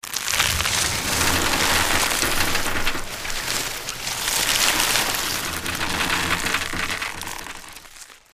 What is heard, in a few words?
Vine Bridge Grows; the unfinished swan